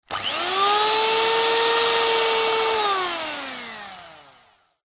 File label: household electric whisk on run off short burst; zapsplat